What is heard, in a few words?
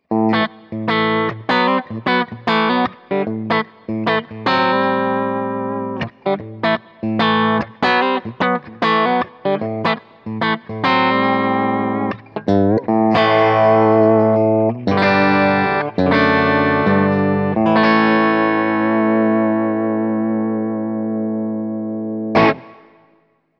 Bridge Humbucker Clean; Charvel Marco Sfogli Signature